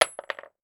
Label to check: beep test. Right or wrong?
Wrong